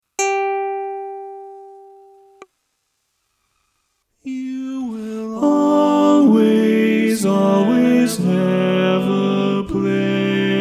Bari, barbershop tags

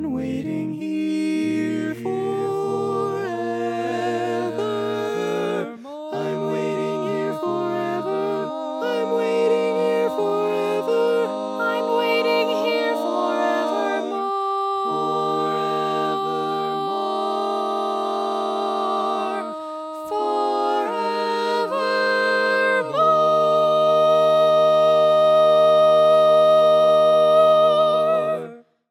tenor

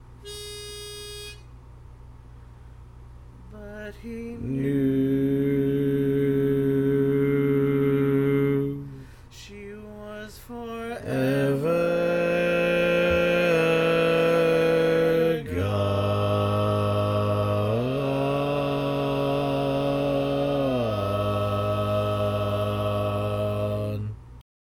Bass, barbershop tag

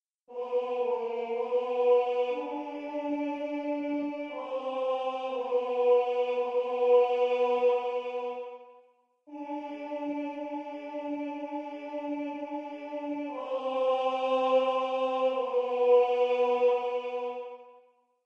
Tenor, barbershop tags

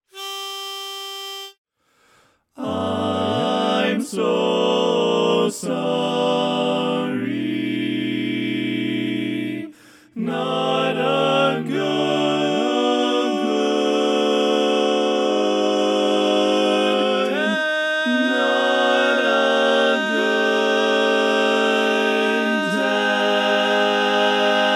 bass

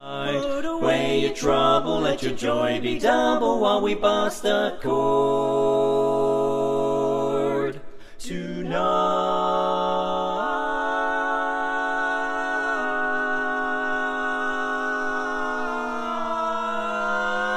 Bass, barbershop tags

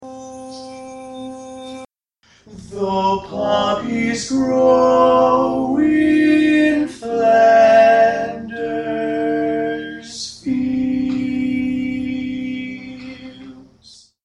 Lead, barbershop tags

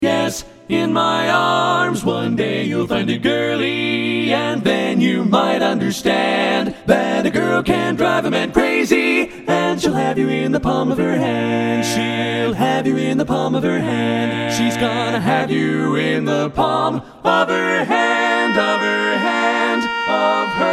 All Parts, barbershop

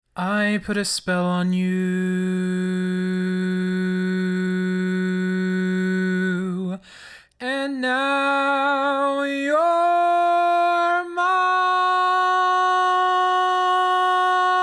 Lead, barbershop tags

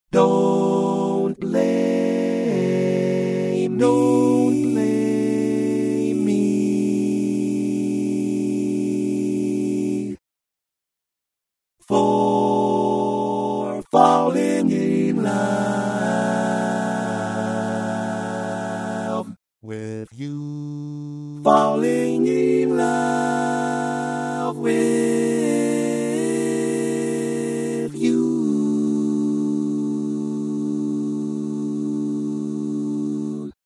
Bari, Barbershop

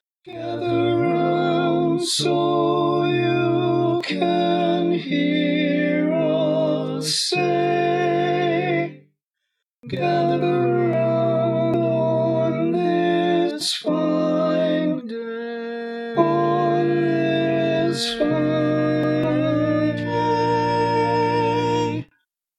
Tenor, barbershop tag

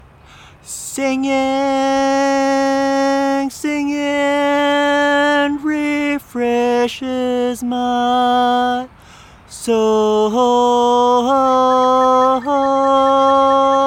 Singing Refreshes my Soul, Lead, Barbershop

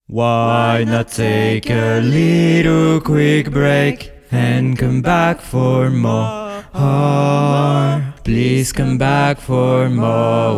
Bass, barbershop

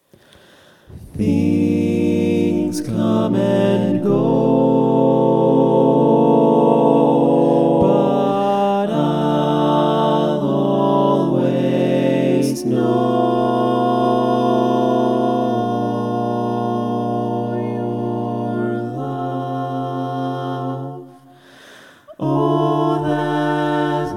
barbershop tags, all parts

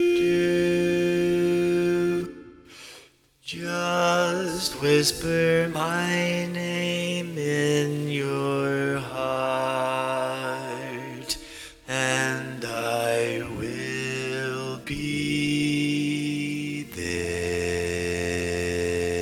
Bass, barbershop

